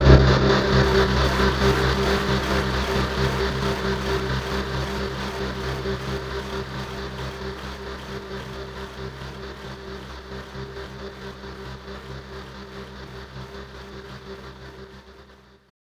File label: ambiant, special FX twisted; USB Soundscan; AKAI